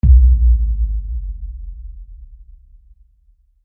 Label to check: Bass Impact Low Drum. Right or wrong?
Right